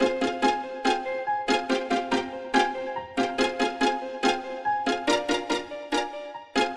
keys

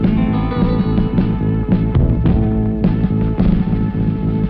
guitar, Sad

